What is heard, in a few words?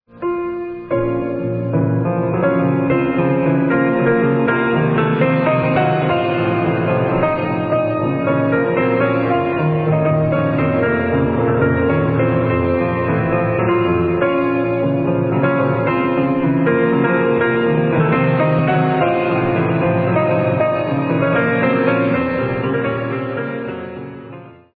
old piano